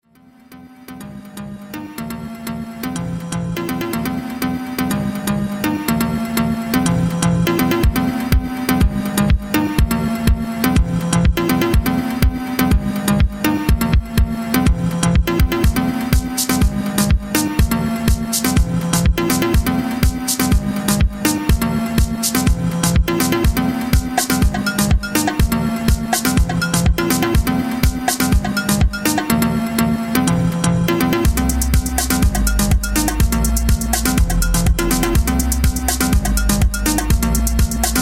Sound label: harp